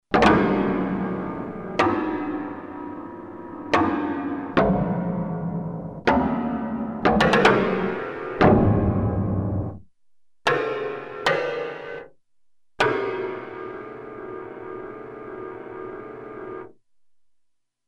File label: Pedal Snap